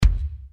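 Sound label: drum bass drum soft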